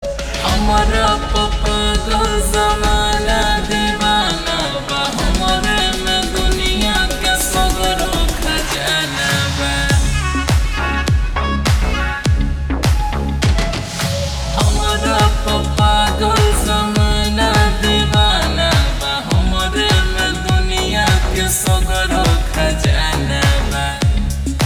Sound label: Slow Reverb Version, Ringtone